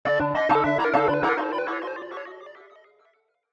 level up, notifications

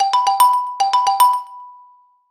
Chirp, Ringtones; Nokia; 9 PureView

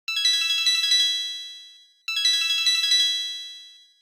Calling, ringtones

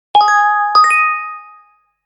Message, Nokia alert tones; Asha 303

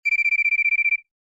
Cricket

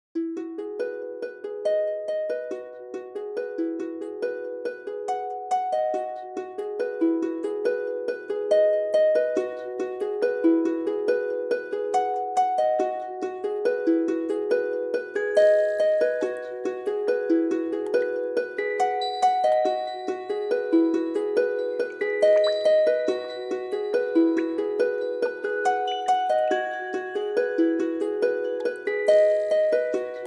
Alarm Little Flickering; alarms

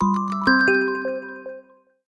Cone, notifications

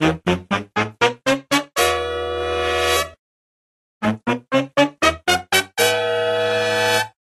ensemble, ringtones; Sony Xperia Z3

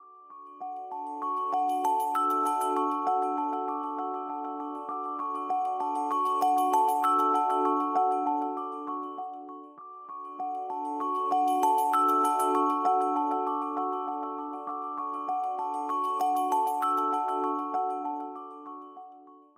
alarms abstract; Amazon Fire-Phone

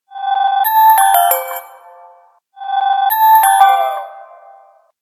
Chrome Ring, ringtones; Motorola MOTOLUXE-XT615

